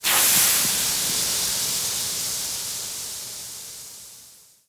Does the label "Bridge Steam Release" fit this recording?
Yes